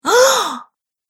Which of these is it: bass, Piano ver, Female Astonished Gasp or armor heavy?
Female Astonished Gasp